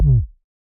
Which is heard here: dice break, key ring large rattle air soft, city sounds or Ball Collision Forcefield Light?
Ball Collision Forcefield Light